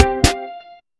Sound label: pop